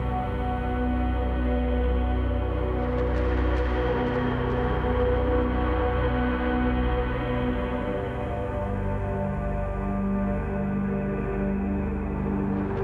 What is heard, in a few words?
Drone,Musical,Airy,Breathy,Crackles with Chords,Flow,Solemn,Loop - occasional spacious wit; occasional spacious with distant